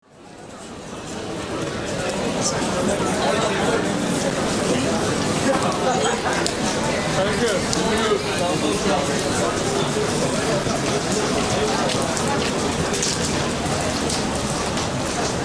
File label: besiktas evening soundscape